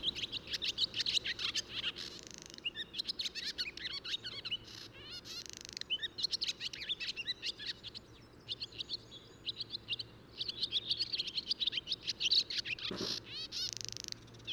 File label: barn swallow